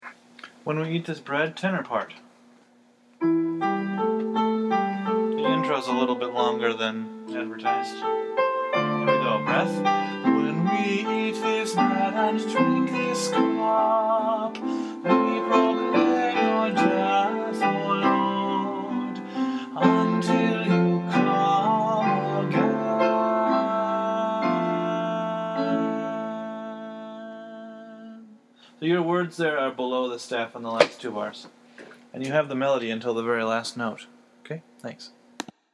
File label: tenor